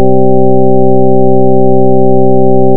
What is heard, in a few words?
two singers